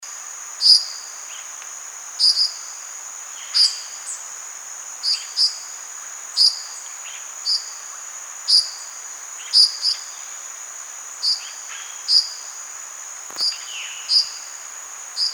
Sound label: Dusky-billed Parrotlet